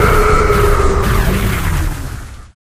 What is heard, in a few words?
Down; Life of a Naomi